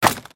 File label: crate; DonkeysAndDroids.Godot